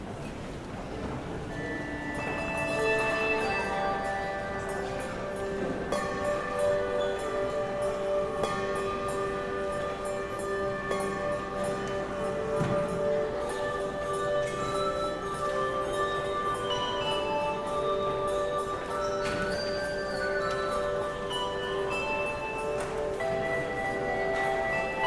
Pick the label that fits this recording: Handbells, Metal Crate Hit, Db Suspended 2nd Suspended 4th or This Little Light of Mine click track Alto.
Handbells